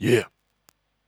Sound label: techno vocals 140